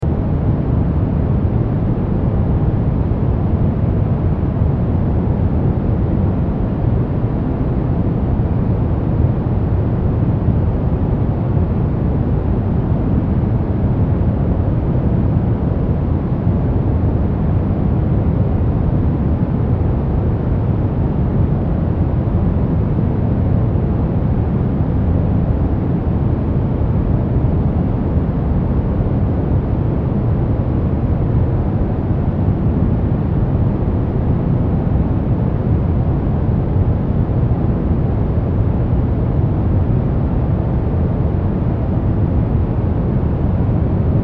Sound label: brown noise